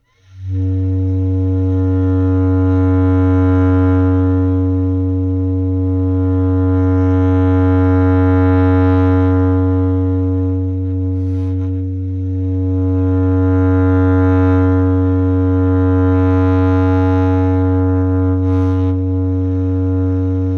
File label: circular breath bass clarinet